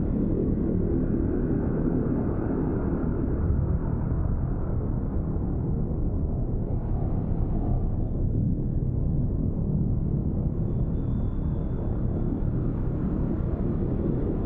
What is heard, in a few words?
ship habitat idle; Stellaris; machineage